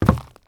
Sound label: zombie footstep wood; DeadFright